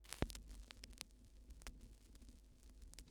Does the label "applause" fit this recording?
No